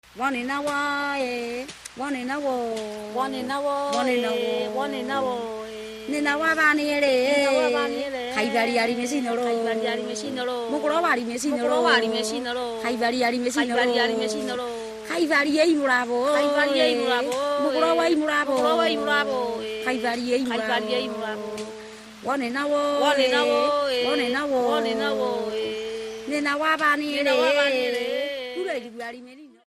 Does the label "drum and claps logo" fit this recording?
No